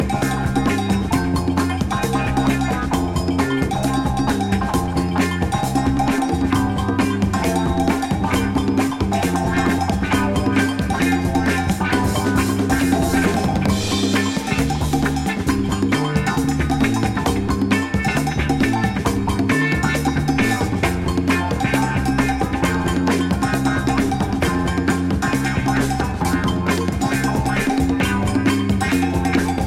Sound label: Percussion Blues